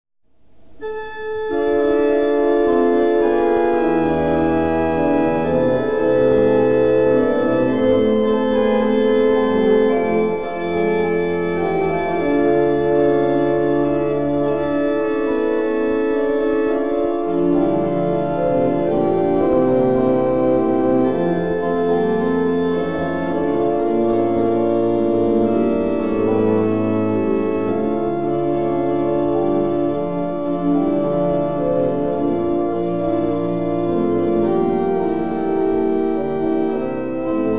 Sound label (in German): Choralvorspiel; Bach; Christ ist erstanden